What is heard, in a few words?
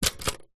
office typewriter key press typebar front close to key vintage 1960; lemair helvetia